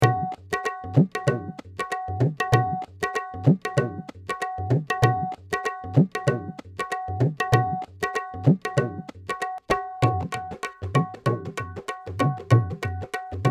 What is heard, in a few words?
tabla